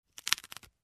Light crackling

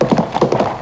Farm horses gallop short

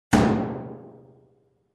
Metal Door bang

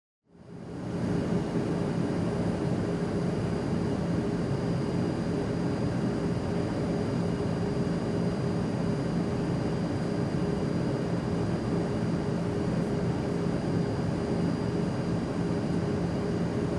Aircraft Cabin Ambience

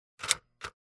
Typewriter Key Click

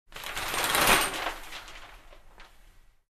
Store Metal Door close

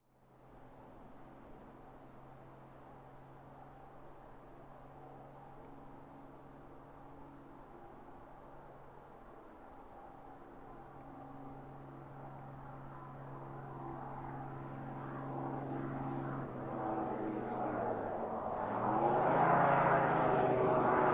Military Truck Approaching

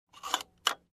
Aircraft Seat Table Usage

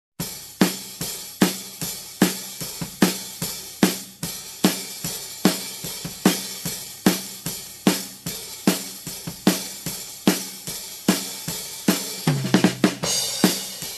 Basic Rock Drum Groove Open Hats

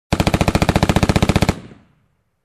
Medium long machine gun shooting

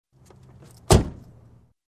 Closing Car Door

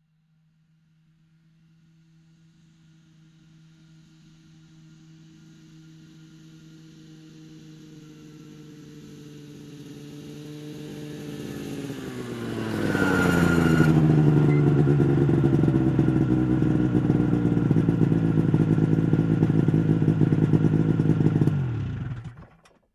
Motorcycle approaching and parking

